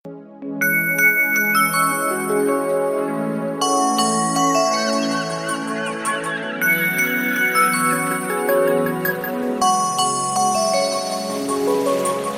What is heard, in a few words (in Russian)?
romantichnaya muzyka dlya sms; sms vdohnovenie